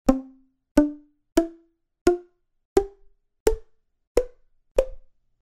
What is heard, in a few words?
C major diatonic; Le blues